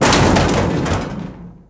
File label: crate bust, explosions; weapons explosions